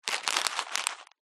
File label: food sunflower seeds pack handling